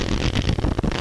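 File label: shuffle, cards; CardTable 2.0.1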